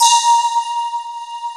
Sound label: DRONE KB; T1 KORG; East West; Global Sounds